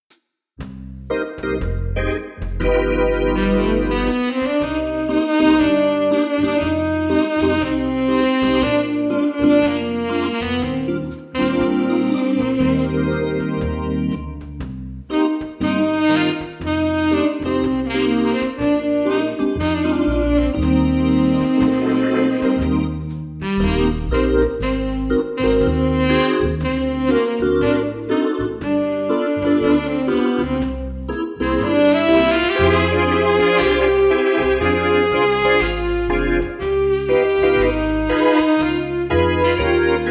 Slow Fox

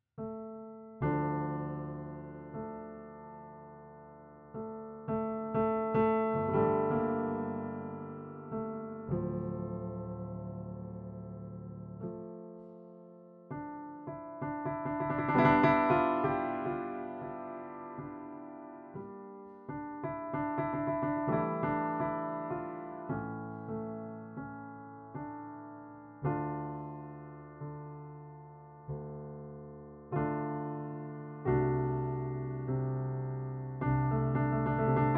Petrof Closed Lid Improvisation

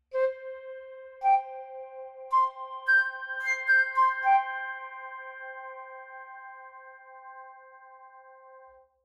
Flute Steinway FX

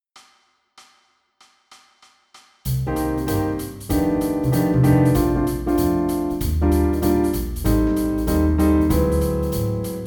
Close Mics

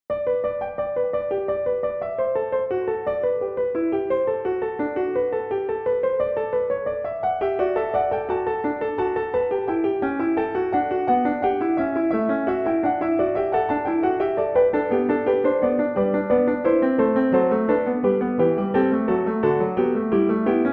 Bechstein 1899 Fughetta, C. Bechstein grand piano; BWV 902.2; Pianoteq

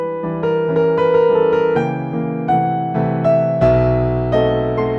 ringing

